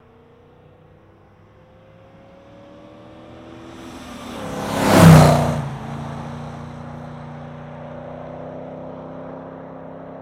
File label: Camaro 1969 Hyper Spa, chevy camaro 1969